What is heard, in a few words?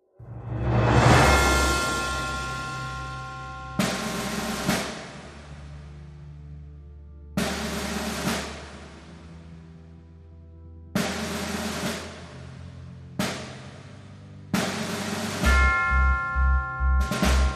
Drums and Bass